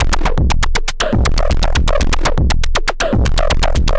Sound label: Anabeat Mix, Beats